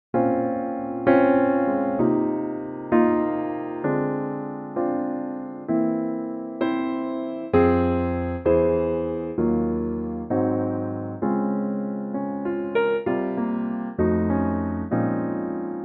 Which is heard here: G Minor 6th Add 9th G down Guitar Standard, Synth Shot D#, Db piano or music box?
Db piano